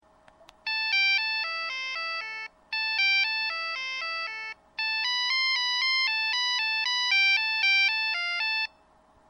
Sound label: popcorn